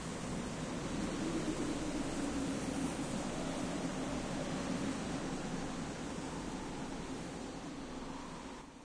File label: desert wind; minetest; ambience